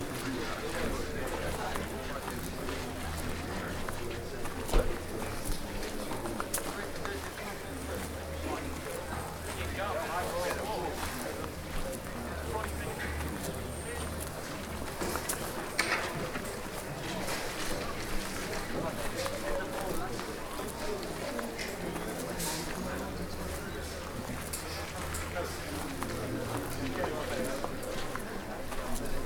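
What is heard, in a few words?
Scottish exterior night; MARSHALL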